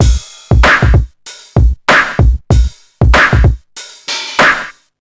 96 bpm drum loop sample A sharp key, kick tuned to the A# note